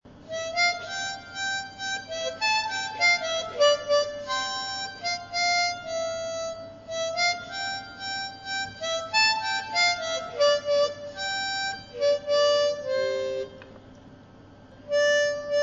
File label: mazurek, harp